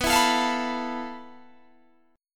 F Major 7th Flat 5th B, guitar chord

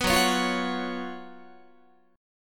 Gb Major 7th Suspended 4th Gb Guitar

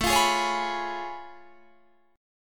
A Augmented Major 9th A down

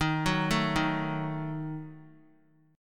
D sharp Diminished D sharp; D#dim arpeggiated; 3C,3F,3A,3D; 27,25,24,25; JGuitar

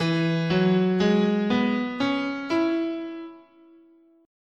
E Major Pentatonic, scale

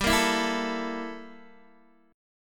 G Minor 6th Add 9th G down Guitar DADGAD

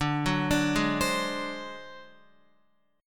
D 7th Flat 5th D, Guitar; D7b5 arpeggiated; JGuitar

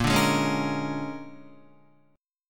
Bb 7th Sharp 9th Bb, Guitar; 5,5,8,6,8,6; Bb7#9 strummed; JGuitar

